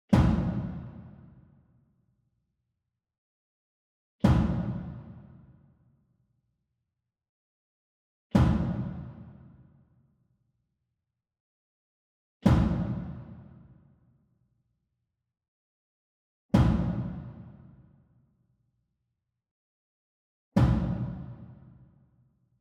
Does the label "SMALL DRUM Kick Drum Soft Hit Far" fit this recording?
Yes